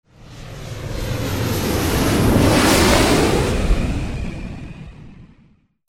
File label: flight